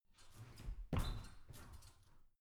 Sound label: trampoline small single jump